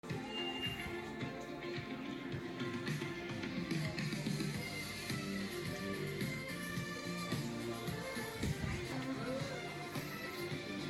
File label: music test